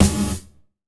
Gated Snare; Gated Reverb; Univerb; musicradar

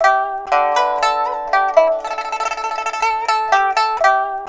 PIPA, 90; INIS - Opium

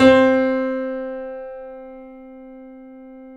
C, The Piano; InVision Interactive - The Piano

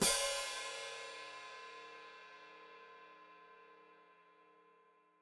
Hat Closed Open, Hi-Hat

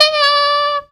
sussed sax; Zero-G; Funky Elements

